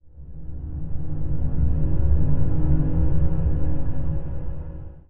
bunker; ambient; Counter Strike Model Pack; CS:GO; survival

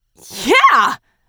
laughing; Half Life 2; Episode 2; alyx; car

